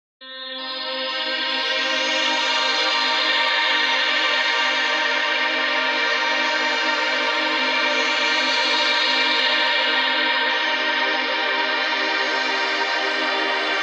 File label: ambient